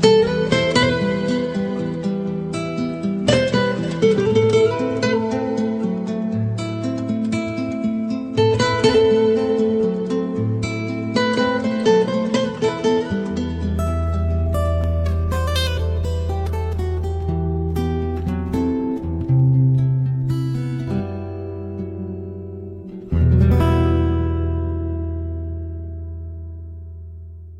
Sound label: best guitar relaxing